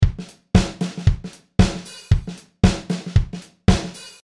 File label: Drums